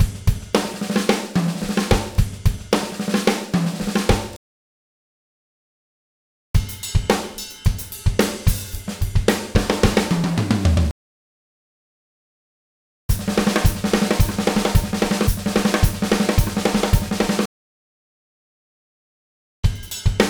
Funky Rock Drumbeat 110 BPM; Ударные (Drum Loops)

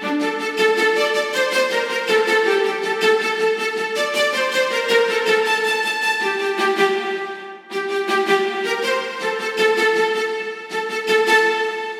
Violas Stacatto; виола

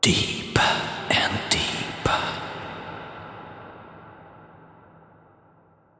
Deeper, ambient

